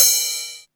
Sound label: CYMBAL PARTS, CYMBALS; CYMBALS 1